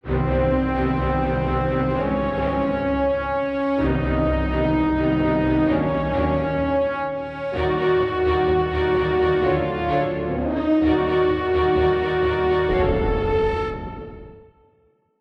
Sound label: Cello Riff; Destroy All Humans